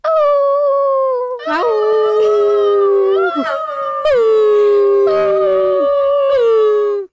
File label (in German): Wolfsheulen; Radio AG